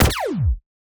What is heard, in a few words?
Laser Gun